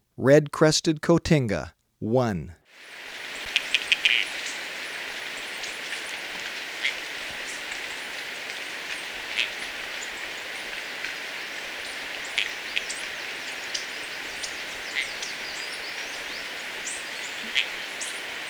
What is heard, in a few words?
Red-Crested Cotinga, Birds of Ecuador; S. America